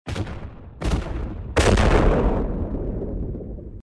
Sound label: Explosion